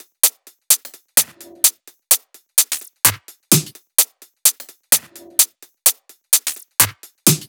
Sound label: 128BPM Drums; Vapour; Vengeance Samples; Vapour Kit